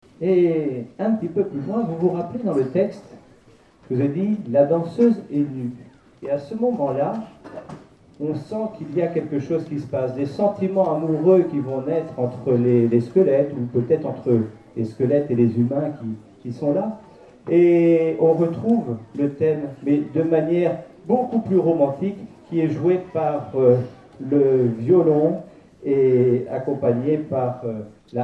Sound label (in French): theme harpe violon